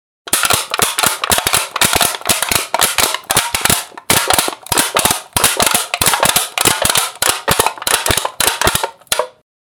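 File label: jars sound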